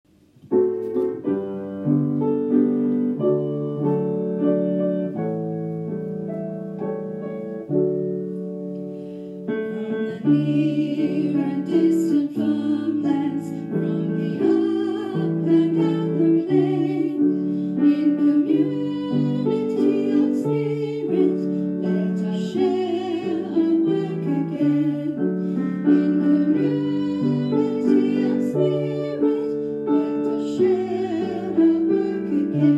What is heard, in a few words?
Song with vocals